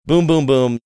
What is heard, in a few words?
boom boom boom